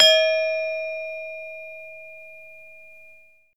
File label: Gamelan balungan pelog